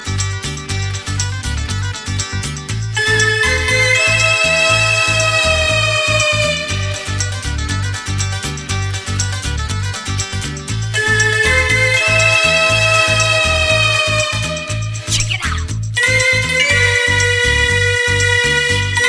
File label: Instrument Song